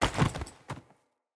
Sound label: Grunt Foley Body Fall; Enemy; Sinecure; Melee Grunt